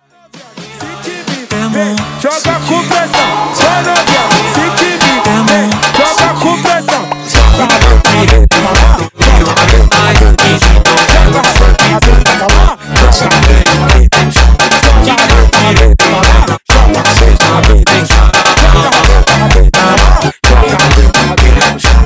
Funk slowed

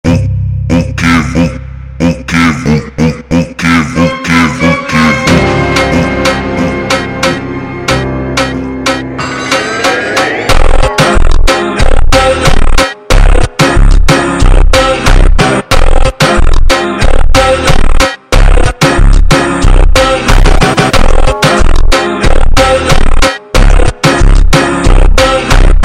Funk ultra Slowed

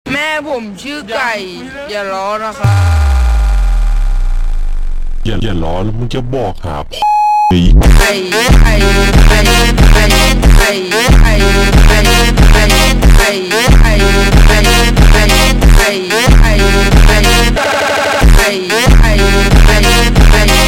Funk slowed

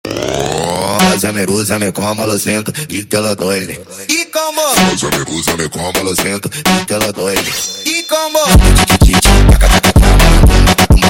Montagem sped Up

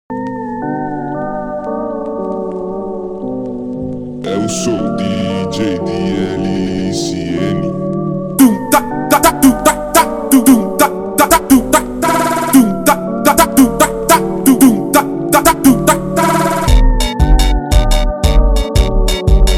Funk slowed

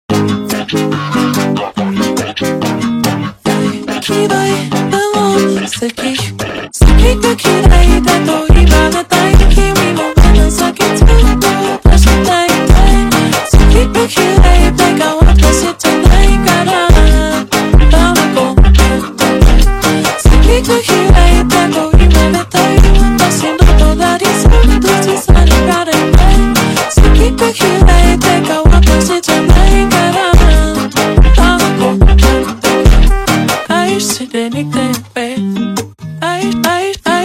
FUNK SUPER SLOWED

